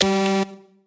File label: gameshow chip fail